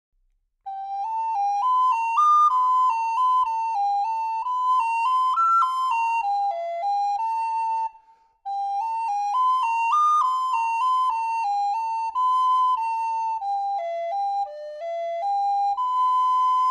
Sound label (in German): Sopran Penta Solo